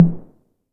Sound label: 808 HT 1 Tape, Tom; Tape808; Goldbaby Tape808 & Tape909